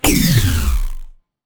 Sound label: vega weapon; fertig classic games